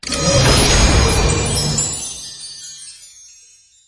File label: Levelup; the great ottomans strategy battle for throne android